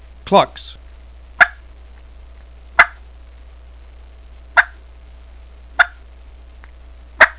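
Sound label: clucks, turkey calls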